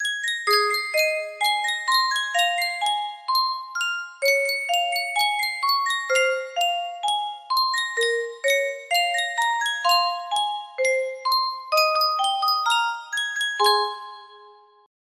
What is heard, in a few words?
Yunsheng Music Box, music box melody